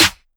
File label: Kick + Snare